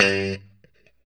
POP G 2, STRAT guitar; Spectrasonics; Hans Zimmer Guitars VOL-1; JC-STRAT POP

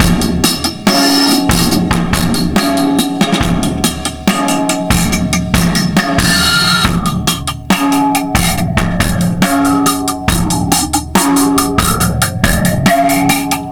Bi Phased 70 bpm, breaks; Zero-G Beneath the Planet of the Breaks

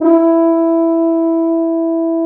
Orchestral Brass

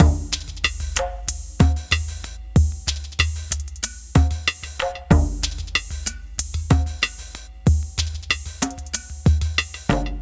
Ethnotronic, Processed Percussion

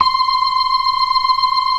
96 BLOOD R 1, ORGAN; Keith Emerson Signature Series

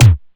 Kick, DIGITAL KIT

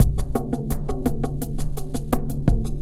DEEP TRIBAL MACHINE GROOVE; Sonic Foundry (Sony Creative Software); Vir Unis Infusion

